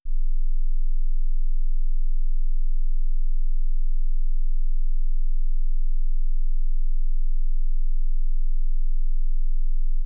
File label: 20Hz